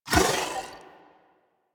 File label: Dodge Impact; Warframe; Pacifist